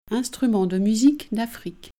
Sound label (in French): afrique musique